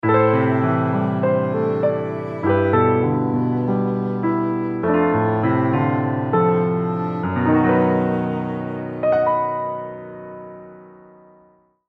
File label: sad jingle